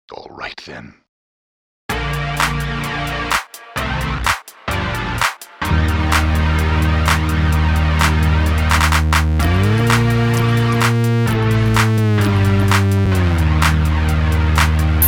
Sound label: MIDI ditty; spellman studio